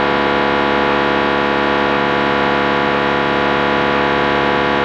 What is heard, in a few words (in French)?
ondes TETRA